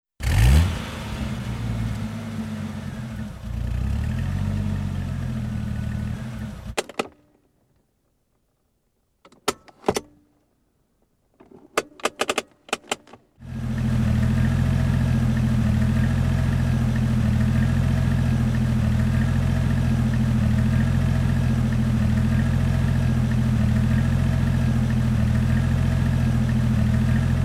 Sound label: Soundscape